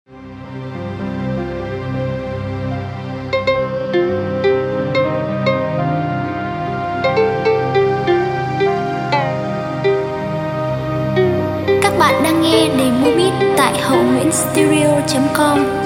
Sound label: beat, playback